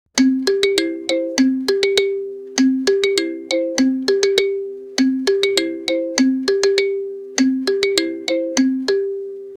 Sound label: playful marimba beat